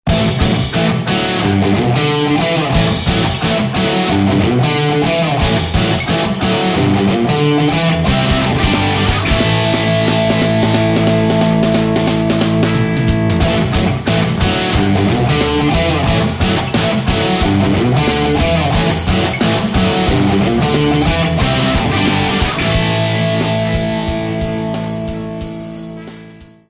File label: Southern Rock